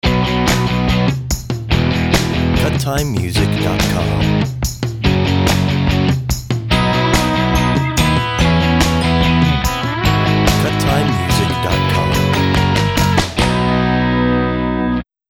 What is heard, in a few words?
Rock